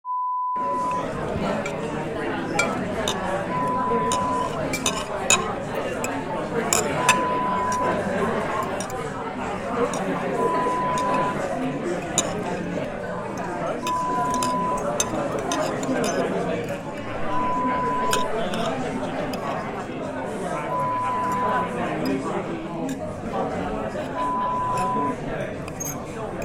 Restaurant sound

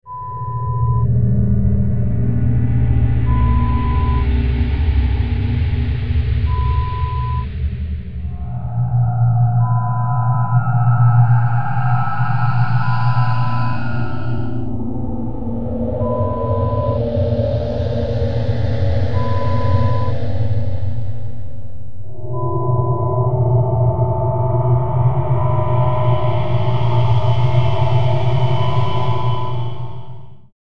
Creepy Atmosphere Horror Sound Effect

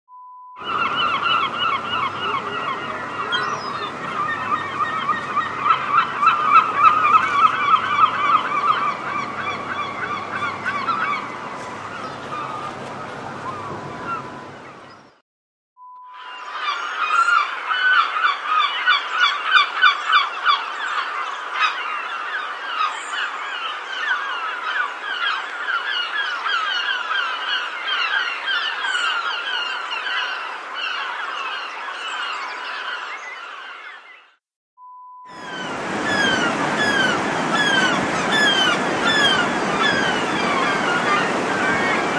Seagulls

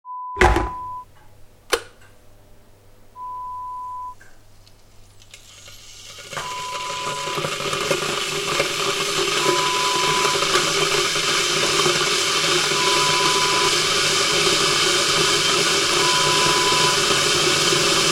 Electric Kettle sound

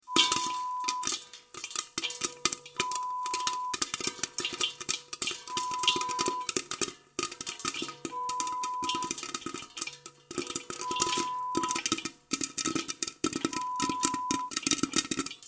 popcorn sound

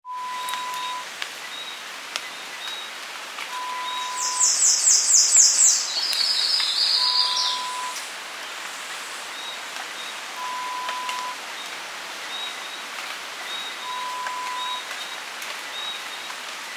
Rainforest sound

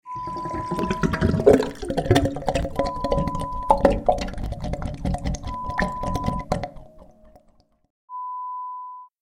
Gurgling sound

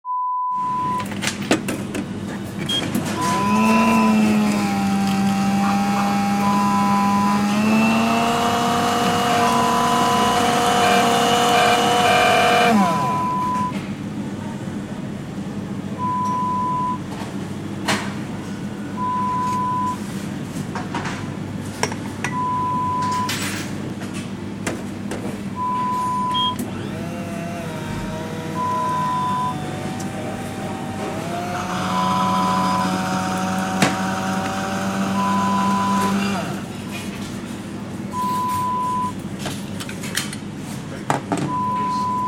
Drinks Machine sound

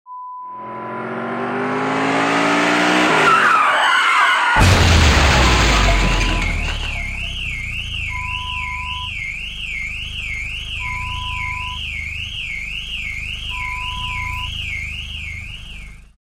Car Crash

